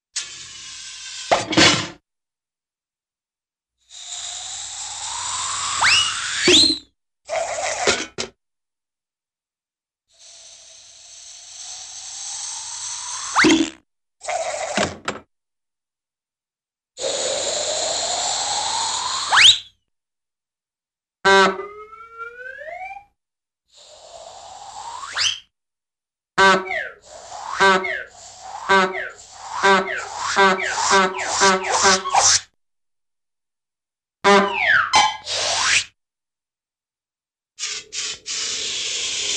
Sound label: Inflate Pop & Land Airy Suction; Cartoon; Hollywood Edge; Cartoon Trax